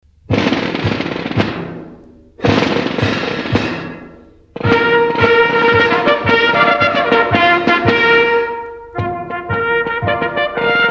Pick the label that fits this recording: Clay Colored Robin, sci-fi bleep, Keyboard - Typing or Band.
Band